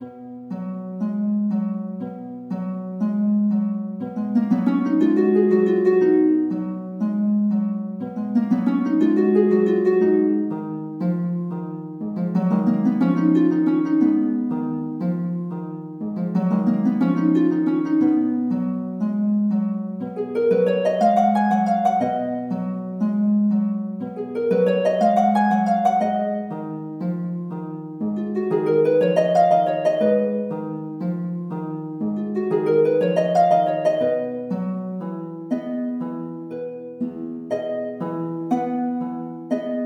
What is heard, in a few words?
harp, chiptunes